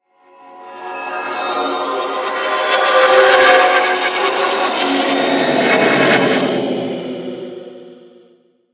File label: Sweeper Swell, Science Fiction